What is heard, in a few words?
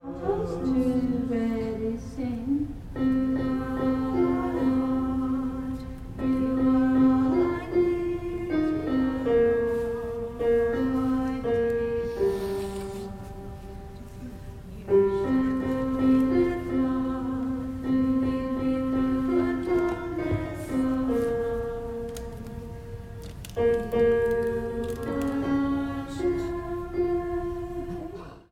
alto; Gather Hymnal